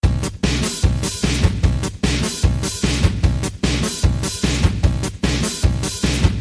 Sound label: boss beat; papyrus